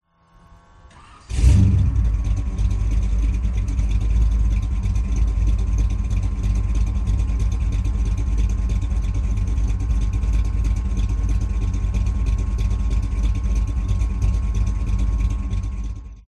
VINTAGE CAR Chevrolet 57 Start Engine Exhaust Close; TRANSPORTATION